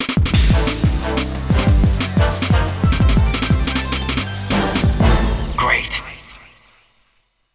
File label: Alternative Mission Completed